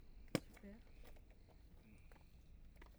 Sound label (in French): essai, chiro